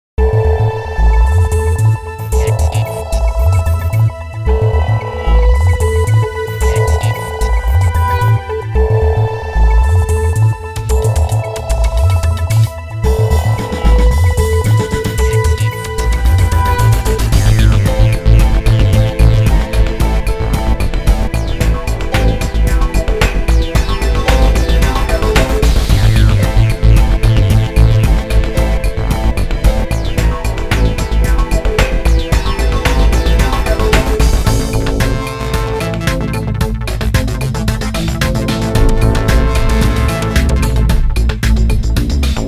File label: Darkwave 112